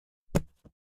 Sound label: ebook open; oneplus